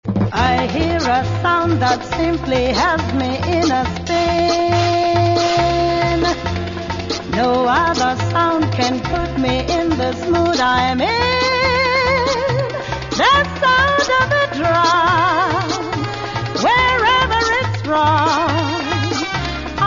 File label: Sound of a Drum